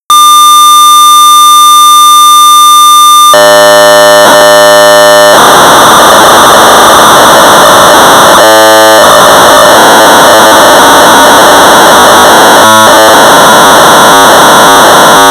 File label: BASIC Sorcerer 1200 baud; Create Graphic Characters; Practical Computing Dec Pg.90